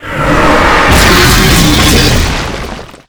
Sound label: Big Yeti Skill; Seer; GamigoNA